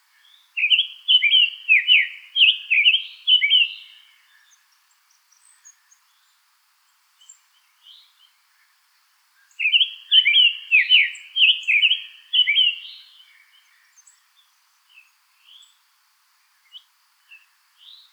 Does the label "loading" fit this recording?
No